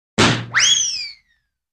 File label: drum smack and wheeeeeeee